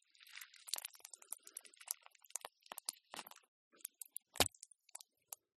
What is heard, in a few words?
GUSH BLOOD DRIPS; CLEAN; PMSFX